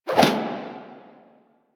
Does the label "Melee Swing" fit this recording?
Yes